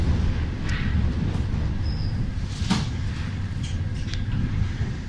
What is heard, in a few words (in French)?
freinage, Metro